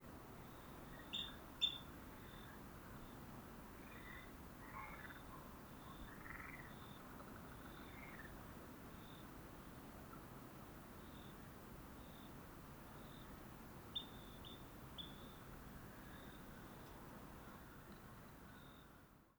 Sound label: pik nfc